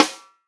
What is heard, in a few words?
snare drum, POP SNARE CHROMATIC